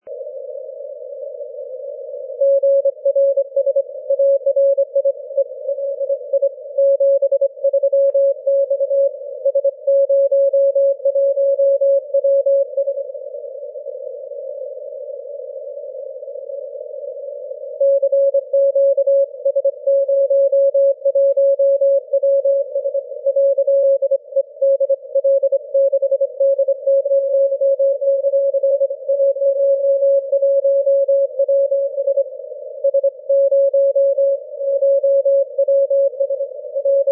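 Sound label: 6m continuous wave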